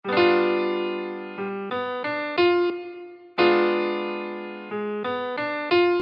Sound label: minor 2 5 1